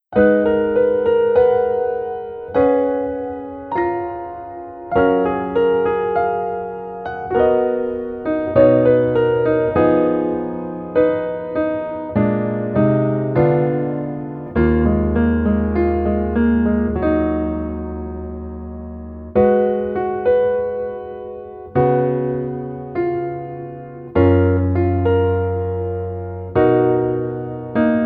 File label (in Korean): Piano ver -1 키